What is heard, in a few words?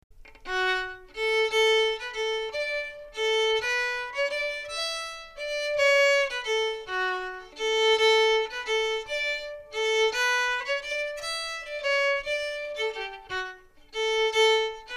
slow